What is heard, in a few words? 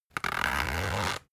zipper run; objekte; reissverschluss